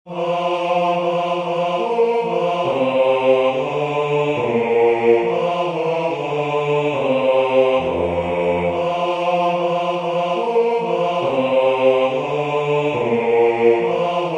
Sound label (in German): Es ist ein Ros entsprungen bass